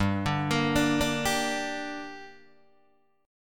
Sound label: G Minor G down Guitar Open D, chord